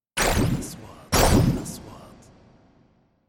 نغمة اندفاع مائي سريع; transitions

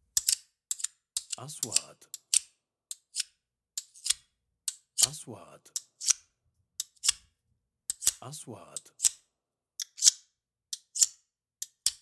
سكين معدنية; sound effect

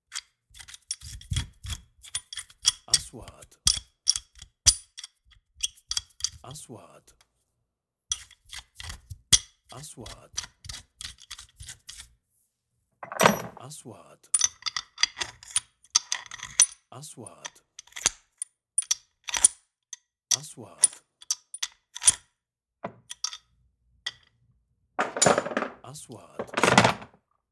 مفتاح تريت

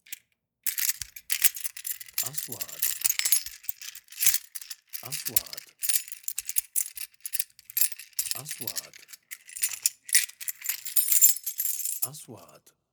مفاتيح معدنية; sound effect

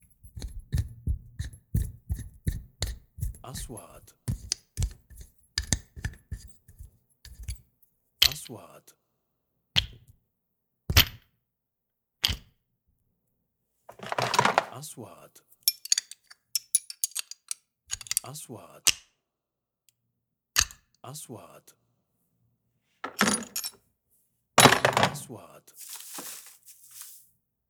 ادوات سباكة; sound effect

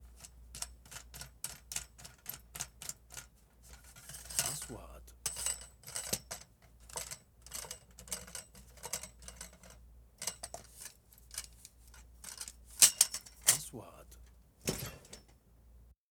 صوت حامل اضاءة التصوير; shooting

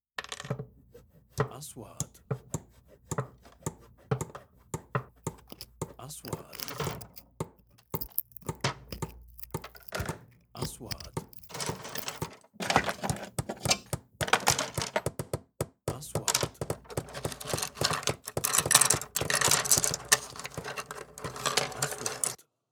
معدات نجارة; sound effect

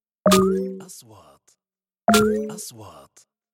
نغمة نقره الكترونية, transitions

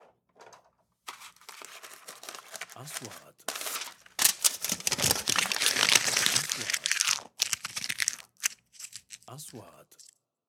صوت قصدير; sound effect; kitchen

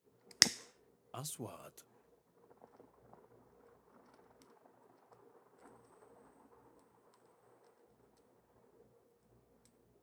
صوت شعلة نار بخور عود; sound effect

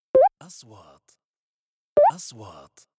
نغمة نقره هوائية; transitions

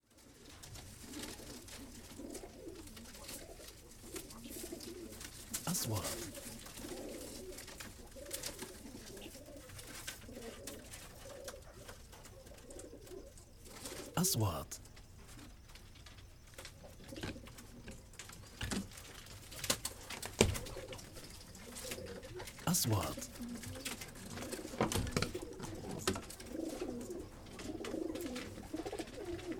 أجواء حظيرة طيور متنوعة; sound effect; room tune

